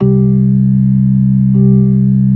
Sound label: B3 C 3; Best Service Hyper Dance